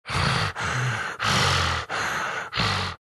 breathing; bloodseeker

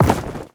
pennant drop, inventory; ui

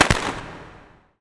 firecrackers; ambient; newyear; dota

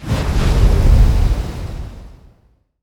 leech seed cast; treant

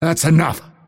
lasthit pain; dota; phantom assassin

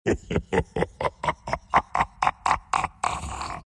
laugh, centaur; dota

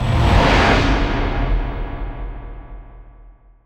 flame cloak; weapons; dota; lina

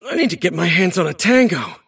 move pain; davion; dota

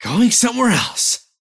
teleport pain; dota; kez

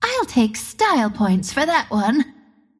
deny pain; Windrunner

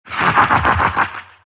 silt laugh; dota; siltbreaker

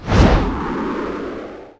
spear projectile; weapons; dota; mars

